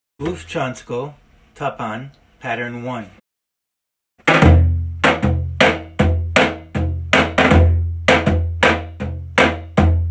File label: tapan; Bufcansko